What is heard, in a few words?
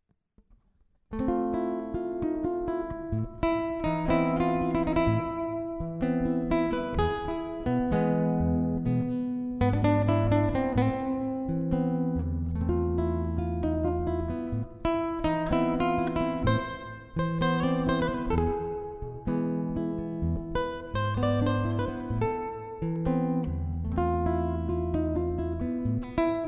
tango